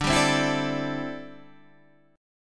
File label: D 7th Suspended 2nd Suspended 4th D, Guitar Open D